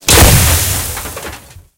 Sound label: tank hit heat small; tanks; War Thunder